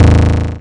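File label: kick, Boss DR-202; Drums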